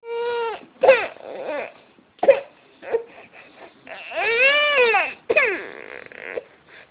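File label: baby hungry, male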